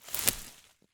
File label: Plant Harvest